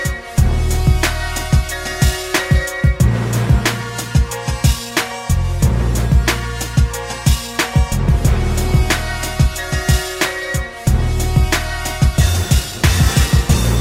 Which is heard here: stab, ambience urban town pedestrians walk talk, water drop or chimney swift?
water drop